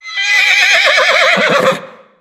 horse shout

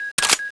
clip out, weapons; tfa cso

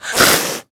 attack; spider

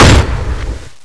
fire; tfa cso; x-7

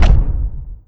step; cyberdemon

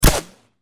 sniper rifle mod fire

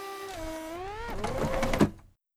crusher display open

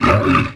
vox attack

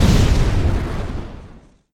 explode; farrondart

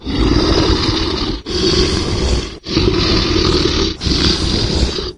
idle, wraith; doom3

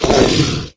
evade left, wraith; doom3

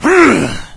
zombie attack; doom3

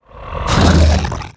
attack

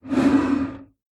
vox idle

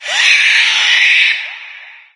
death, cherub; doom3

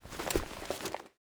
armor step; snds jack gmod

